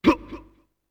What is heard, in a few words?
ATTACK; UFC Tapout; XBOX